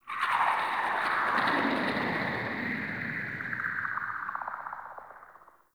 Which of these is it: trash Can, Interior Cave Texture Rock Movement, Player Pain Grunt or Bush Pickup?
Interior Cave Texture Rock Movement